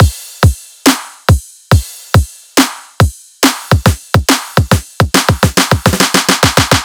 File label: Riddim Drum Loop 140 Full